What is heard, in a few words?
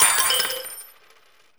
Tornado